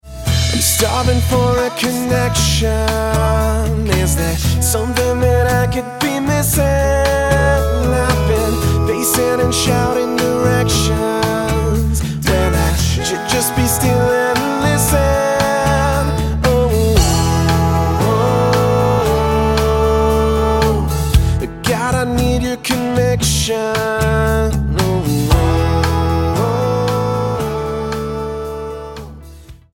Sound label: ringtone